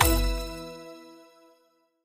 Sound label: sticky Win; king of slots